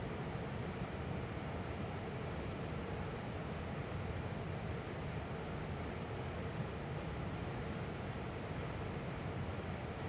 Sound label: indoor factory